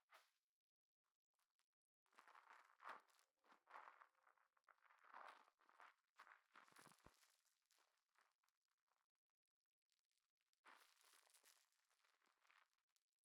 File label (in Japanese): 泡風呂の泡を耳元で; KU100; お風呂に関する音